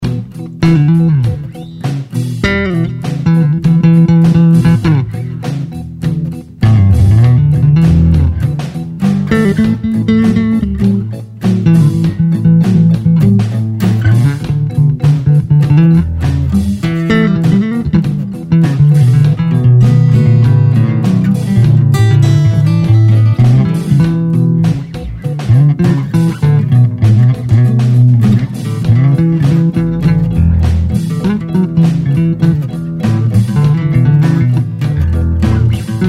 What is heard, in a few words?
Drivin Blues In E 100 Solo Bass; Blues Solos